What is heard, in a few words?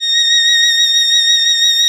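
ARCO VIOL, Arco Solo Violin; E-MU Producer Series Vol. 2; More Studio Essentials; Composer; St. Arco Solo Violn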